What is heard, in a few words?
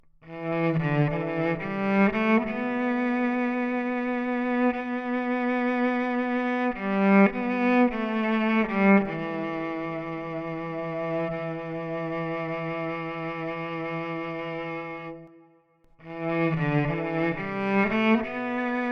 Strings With Hall Reverb And Gated Reverb; انواع ریورب